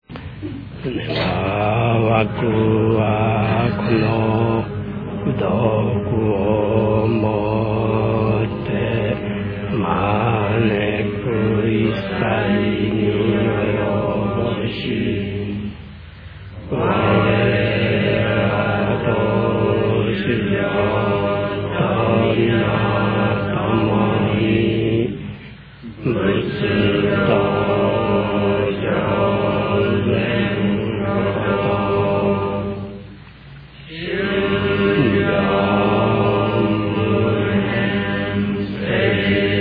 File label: end chant